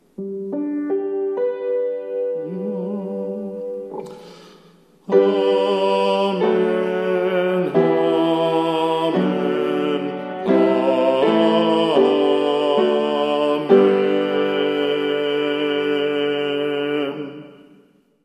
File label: 3 fold Amen Alto, choir